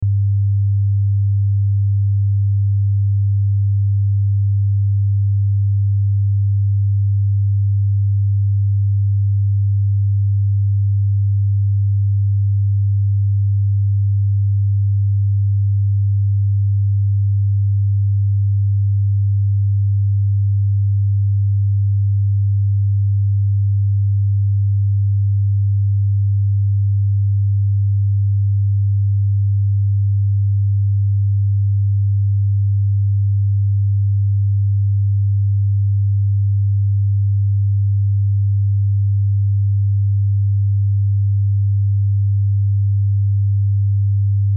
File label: Test Tone Sine; Sine Wave 100Hz 60 Sec -12Db Loop; Sound Design; Blastwave